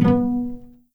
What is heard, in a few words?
B2 PIZ CEL, string; East West; The Ultimate String Collection